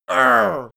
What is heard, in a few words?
goblin hit; schreie